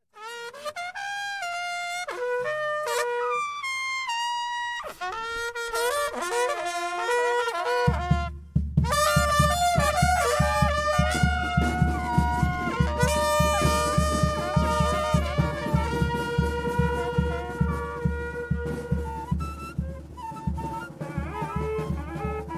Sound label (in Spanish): Muestra de Turumarinera